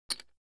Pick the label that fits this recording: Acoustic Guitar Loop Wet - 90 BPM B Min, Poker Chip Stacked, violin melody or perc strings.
Poker Chip Stacked